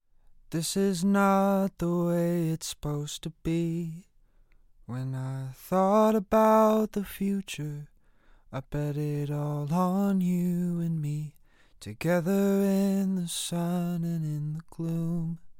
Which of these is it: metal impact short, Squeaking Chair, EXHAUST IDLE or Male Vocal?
Male Vocal